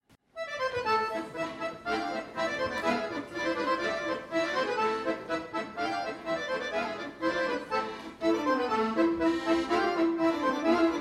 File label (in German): Polka; Quartett